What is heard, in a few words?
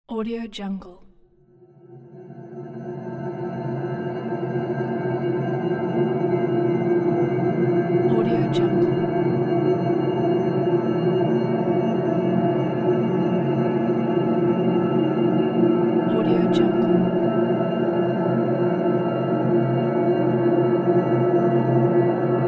suspense ambience